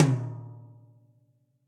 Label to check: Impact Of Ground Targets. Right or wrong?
Wrong